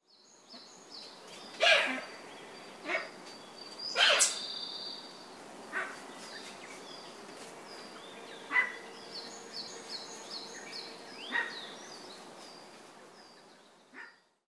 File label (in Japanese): yamador, sado